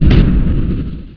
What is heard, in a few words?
stone end, doors; heretic ii demo